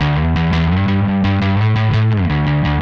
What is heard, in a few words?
Static Bass